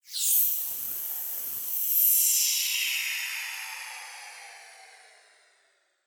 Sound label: Silicone Leak; Plastic Surgery